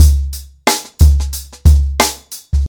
120 bpm breakbeat sample c sharp key, kick tuned to the C# note